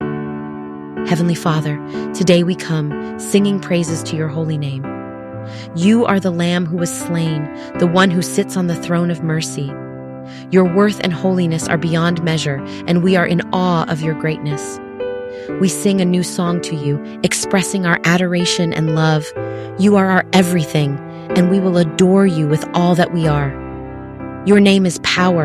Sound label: Prayer